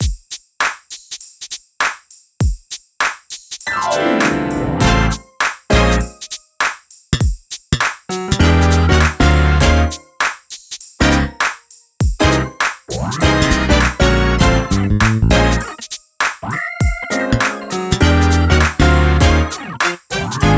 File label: drumless